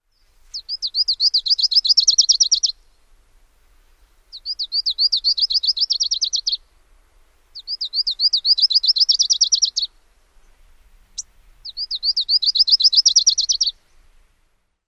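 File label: songs, bird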